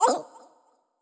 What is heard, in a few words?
Bark Damage